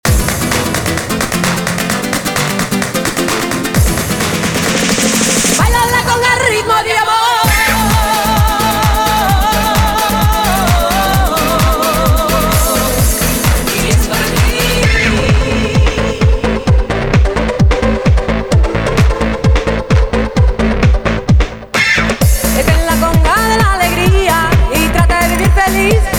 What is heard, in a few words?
CONGA FEELING; DDR 4th Mix Plus